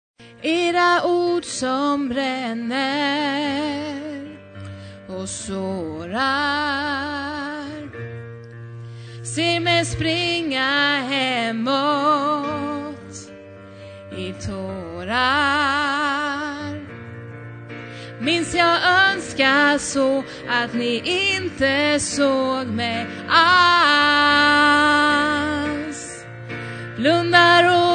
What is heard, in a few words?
tenor